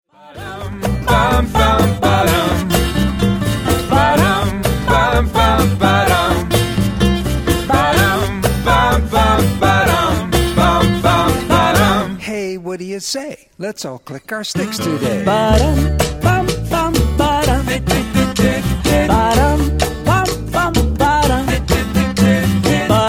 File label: stick tune